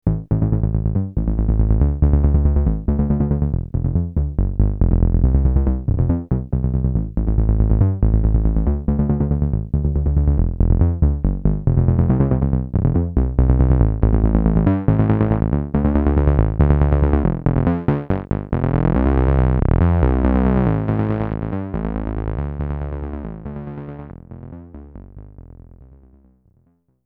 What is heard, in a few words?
simplesizer bass